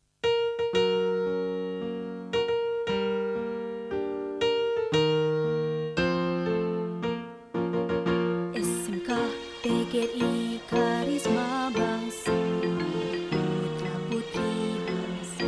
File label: SOLO